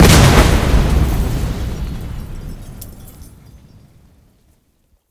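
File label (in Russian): опасный оглушительный резкий грохот после взрыва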